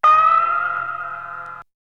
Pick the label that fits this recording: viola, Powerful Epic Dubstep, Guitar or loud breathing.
Guitar